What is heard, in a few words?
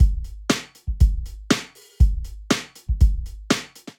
Altered Kit, Drum Kits; Ableton; Drum Booth